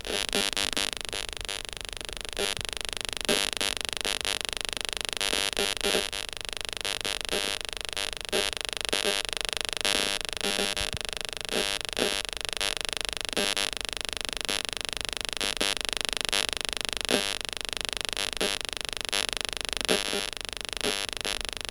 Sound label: Weird speaker buzzing; SFX; IRL Recorded